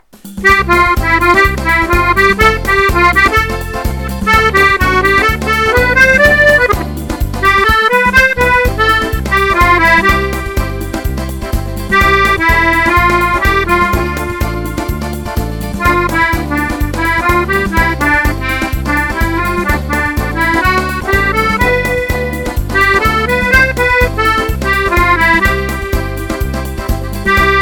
samba

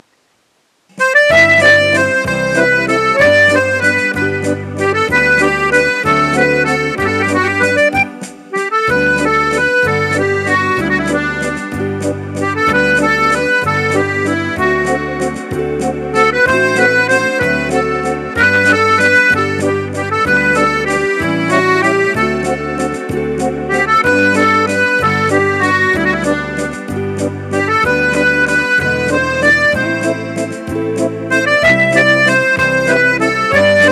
valse à l'ancienne

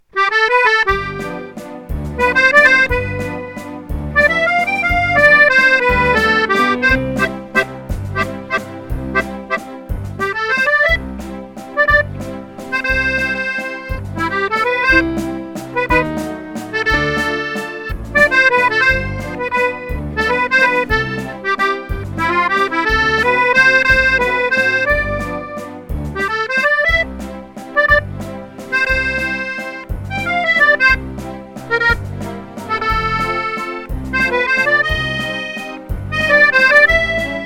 romance viennoise, valse viennoise

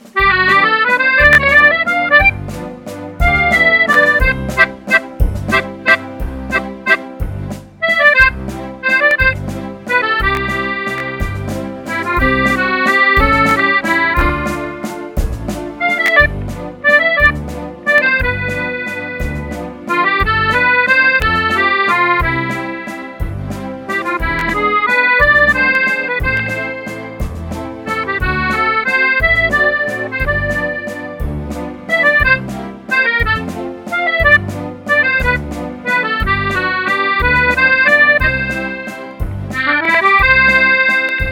viennoise, valse viennoise

